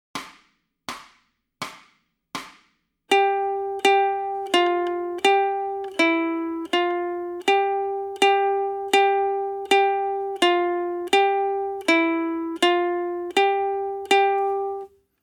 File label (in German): Ton G Warm-up, ukulele